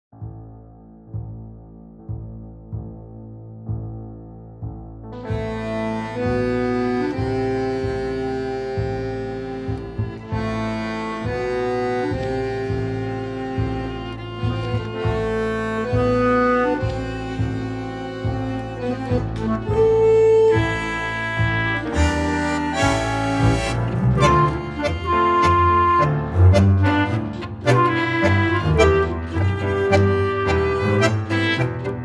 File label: clarinet